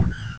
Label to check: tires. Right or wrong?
Right